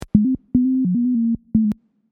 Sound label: tones